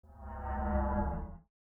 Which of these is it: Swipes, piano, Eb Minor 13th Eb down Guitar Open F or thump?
Swipes